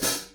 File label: hat